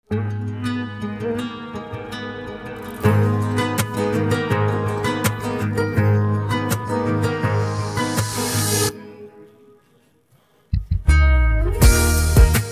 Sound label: instrumental, persian